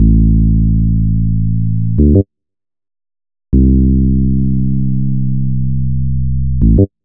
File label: Bpm136 Bass Loop C A; Mesmerise; Prime Loops; Dubstep Illusions